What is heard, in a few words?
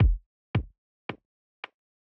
Kick Break Before Chorus, pop rap